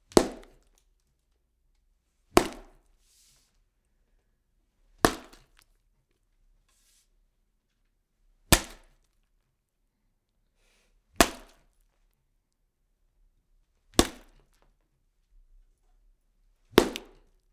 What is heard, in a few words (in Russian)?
udari v myaso palkoj